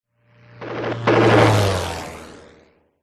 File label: plane; c-evo